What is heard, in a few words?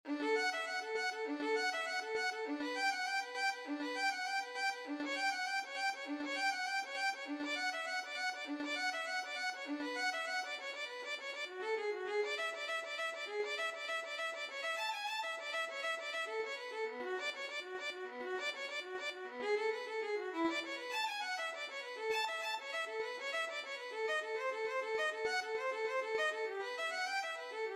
cello suite; bach